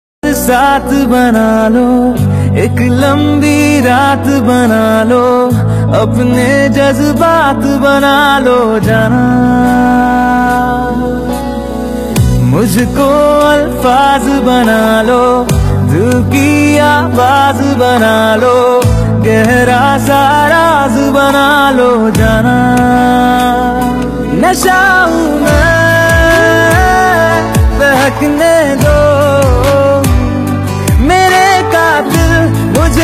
Romantic Hindi Song Ringtone

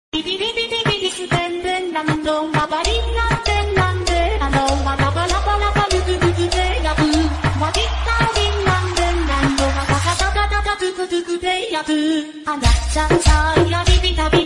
Music Ringtone; Tintin

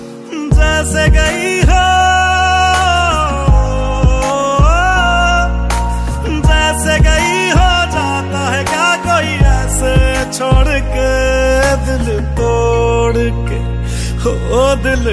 Sad Hindi Song Ringtone

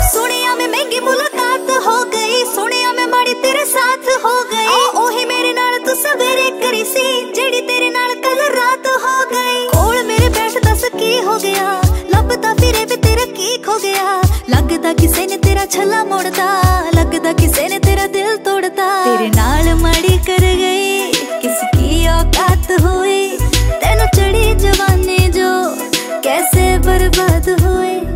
Female Version Ringtone

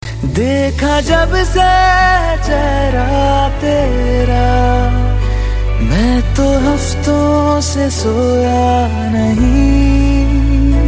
New Hindi Song Ringtone

